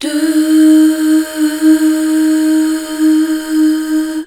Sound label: DUUH, BACKGROUND